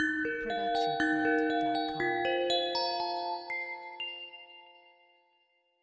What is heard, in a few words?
bright bell jingle